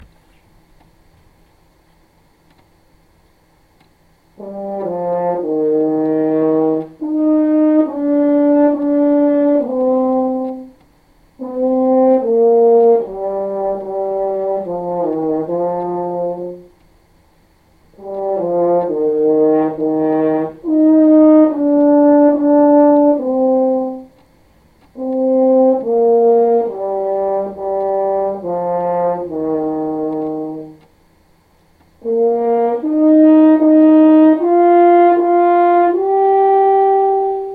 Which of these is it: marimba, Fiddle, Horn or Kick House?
Horn